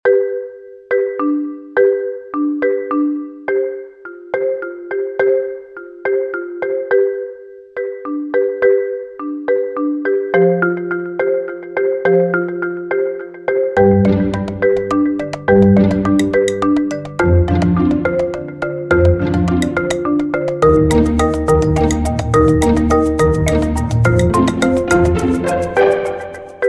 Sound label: Main Street Mallets